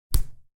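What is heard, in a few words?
high five sounds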